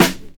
short steel snare drum sound f key, Royality free acoustic snare tuned to the F note.; snare-drum-samples